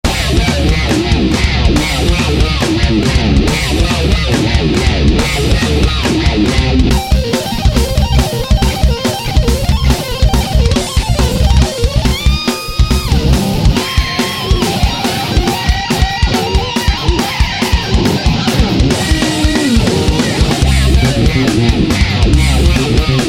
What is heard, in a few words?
Hard Heavy